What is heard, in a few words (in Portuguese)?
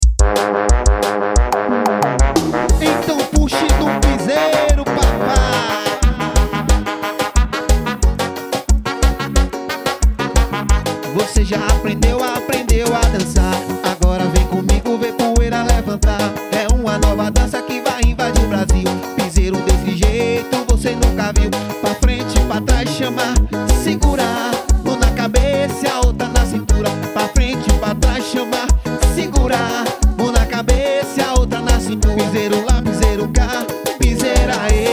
piseiro